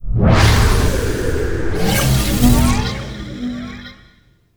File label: Small Portal Spawn; Ambience; Props; Warframe; Duviri